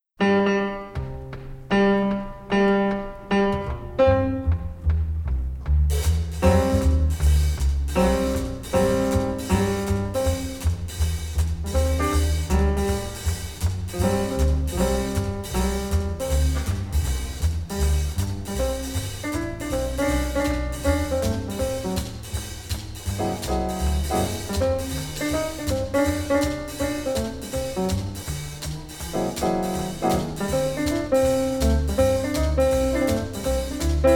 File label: swing